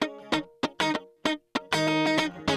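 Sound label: guitar; Zero G; Man Machine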